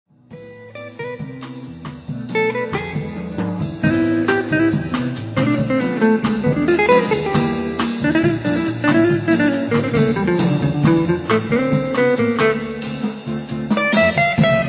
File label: jazz live